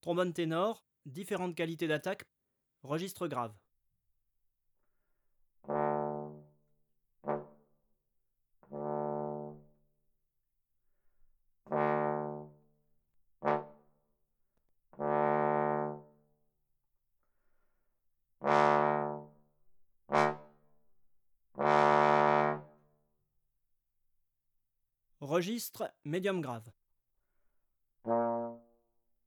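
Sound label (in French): ténor différentes qualités d'attaques, trombone ténor, effets d'articulation; traite orchestration; trombone tenor trombone basse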